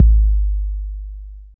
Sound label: 808 BD; TR808+909